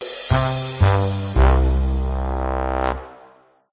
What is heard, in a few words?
fail; nickelodeon basketball stars 2015